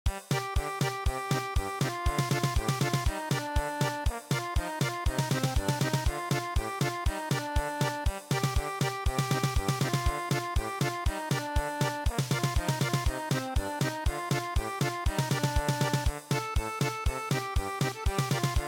German Polka, traditional

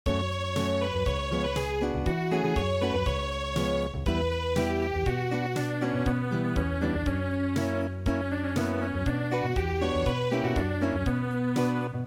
Unplugged

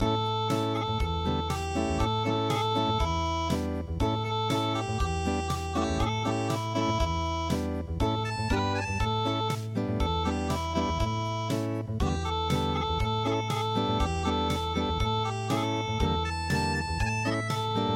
unpluged

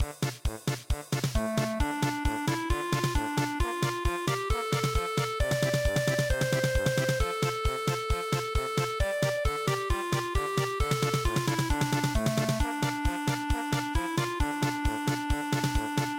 polka; Shalom Chaverim

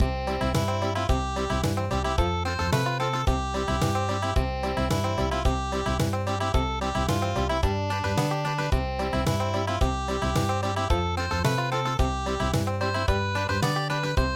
country, midi; Old Black Joe

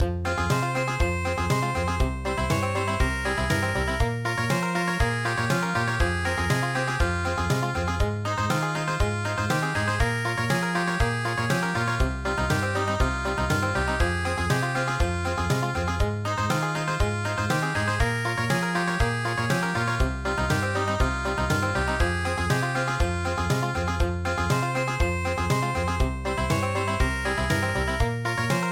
country, midi; kimi wo matsu

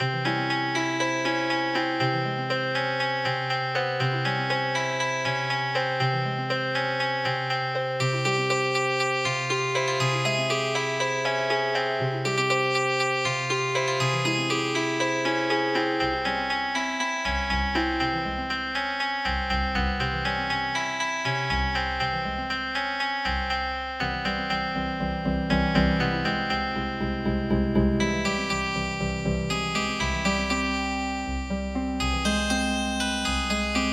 Pakistani Spin Song Healing Harp

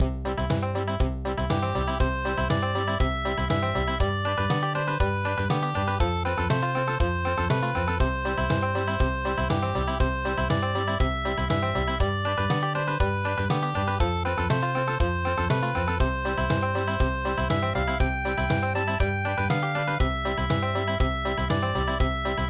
Country, traditional

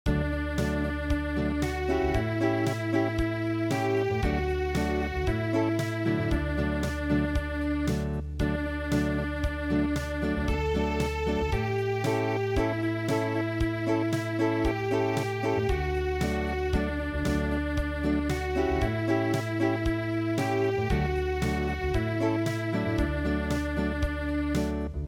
Unplugged, midi

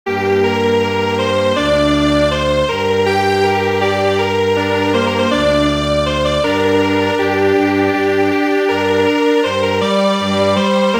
Strings Quartet, midi; traditional

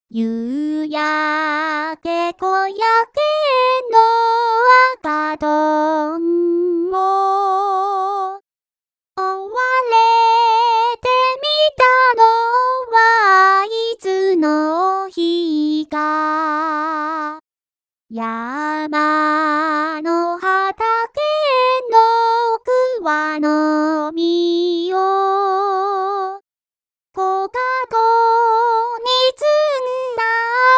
vocal only

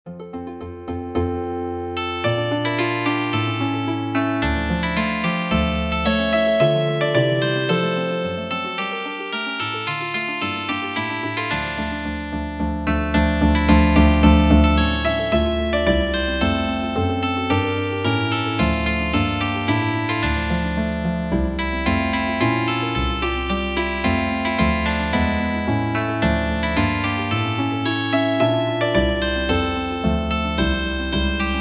Healin Harp

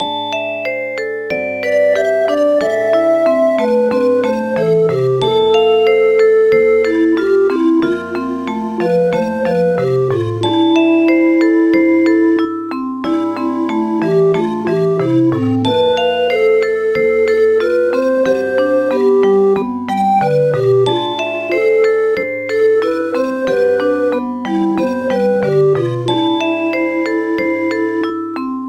Gamban suling

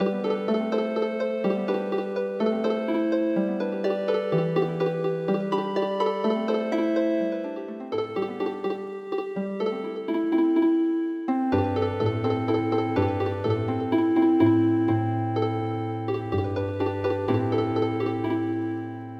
Drawing octopus Healing Harp

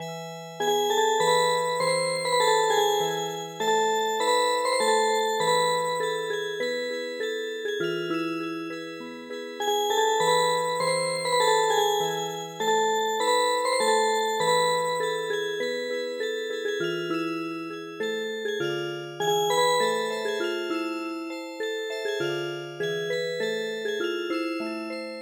Music Box, traditional

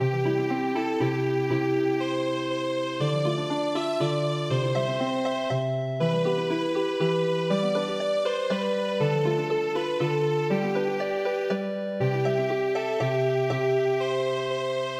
Healing Harp, midi; My Dear Girl

